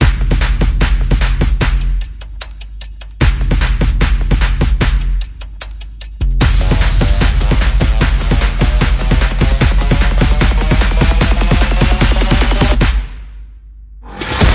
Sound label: Percussion Bass and Effects